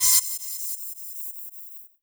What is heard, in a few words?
L to R pulser out